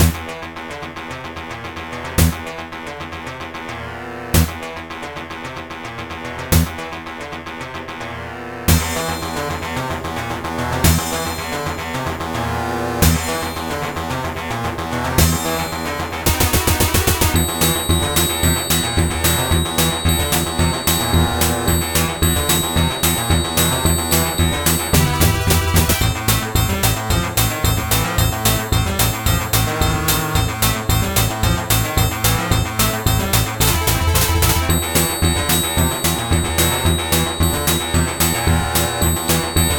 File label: CT2800 O3 sbiload aplaymidi